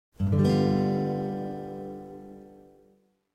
g dominant 7 open

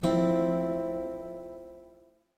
fmaj7, guitar chord

